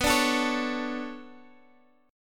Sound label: B-Minor 9th B down Guitar Open G